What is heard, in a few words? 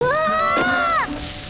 woo; 音MAD; 少女终末旅行; 소녀 종말 여행 음원팩; Syaro Sample